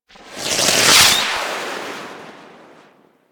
Swarm Missile Projectile; Warframe; Eidolon; EidolonJetpackMarine

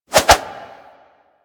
Staff Swing Spin C; Warframe

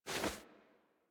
Movement Foley; Warframe; Wisp

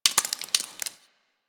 Ice Thaw Drip; Warframe

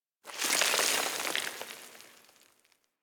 Generic Explosion Rocks Small; Warframe; Explosions; Surface Hit Effects

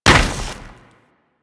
Moa Combined Step Snow, footsteps; Enemies; Warframe

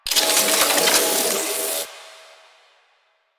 Reload, Weapons; Warframe; Acceltra Prime

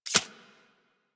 Arachnoid Micro Step Snow; Warframe; Corpus

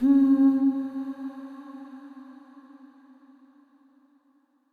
Statue Voice C Sharp Lower; Player Instrument; Statues

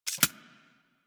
Arachnoid Micro Step; Warframe; Corpus; Steps

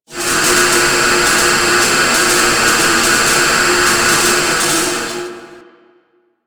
Mining Laser A; Ambience; Warframe; Grineer

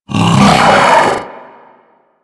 Pet Vocal Pain; Enemies; Vocals; Warframe; Alad V Pet

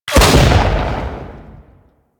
Shogun Shot A, Shotgun; Weapons; Warframe; Tenno; Alchemist Shotgun

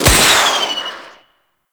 Rocket Fire; Ambience; Warframe; Grineer Fortress

